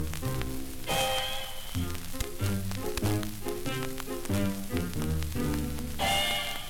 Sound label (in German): klirren instrument